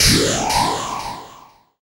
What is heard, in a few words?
FX Dance